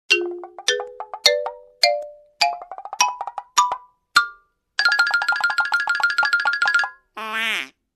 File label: Cartoon Suspense with mocking voice